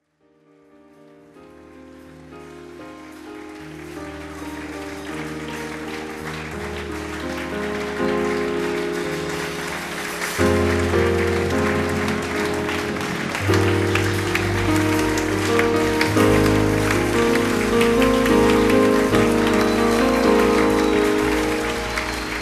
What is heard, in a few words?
Gospel Choir Intro